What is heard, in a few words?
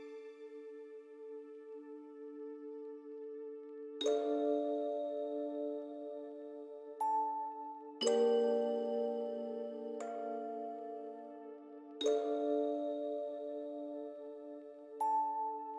Night Ambient (Loop); 25 Rpg Game Tracks